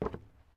Paso zapatill madera

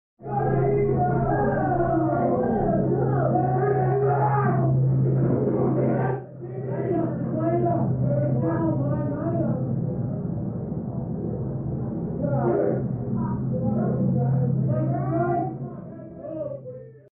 fondo multitud adultos

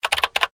Teclas

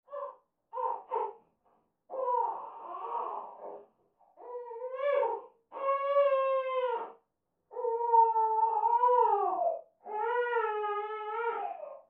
fondo bebe

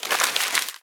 Papelera

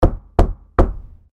Puerta golpe

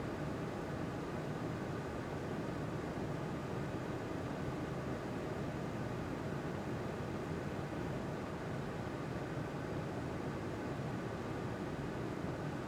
respiradero loop

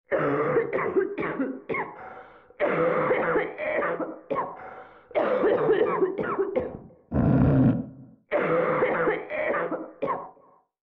Tos vecino

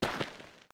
Paso tierra

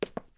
Zapato tacon madera

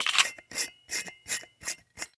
ENROSCAR SILENCIADOR; Yesterday Origins

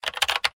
Teclas

